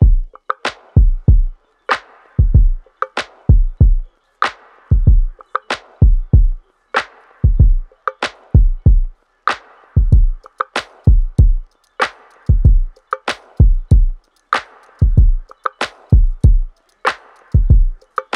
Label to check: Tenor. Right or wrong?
Wrong